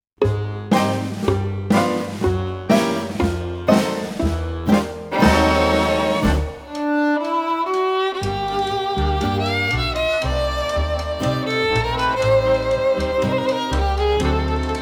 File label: Tango Nuevo Contemporaneo Violine